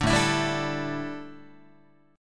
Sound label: A 7th Suspended 4th, A, down, Guitar